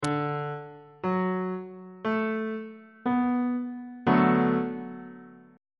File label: c double sharp major 6th chord